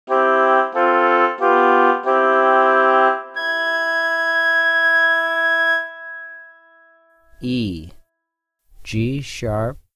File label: E3 G#6 Oboe 2 Note Stereo; Level Two “Stereo” Example; ear training two note